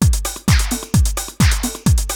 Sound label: house, loops